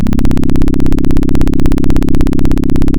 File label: Sound of a plane taking off